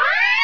overspeed; fgdata

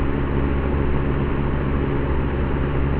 fan; fgdata

